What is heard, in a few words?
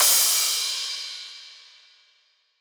Future Bass Crash; Drums; One Shots